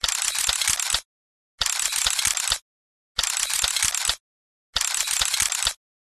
science fiction steampunk machine mechanical operate small; zapsplat